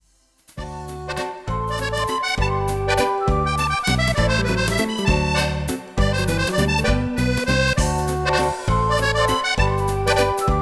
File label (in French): musette, valse